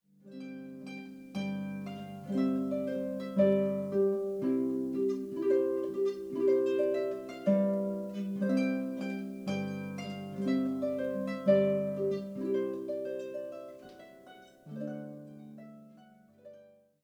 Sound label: Trumpet Tune, harp